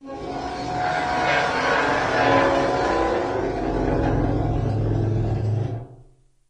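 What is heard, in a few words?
Metal Chalkboard Squeak; groans; scrapes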